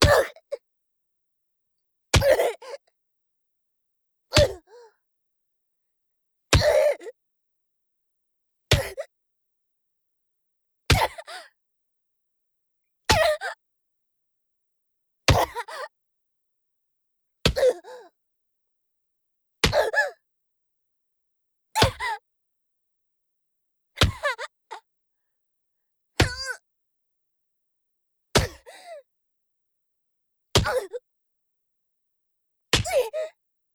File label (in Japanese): 腹アッパー BPM110; 効果音あり